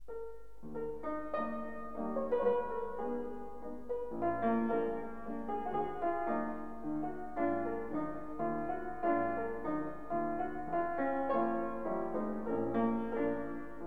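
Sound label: Mazurka in Eb min, Piano; C.Filtsch; Hommage a Erard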